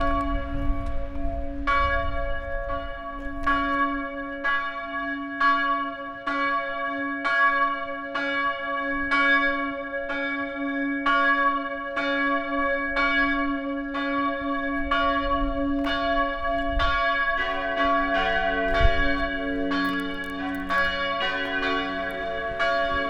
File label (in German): Glocken St Stephanus; Kirche Schwendi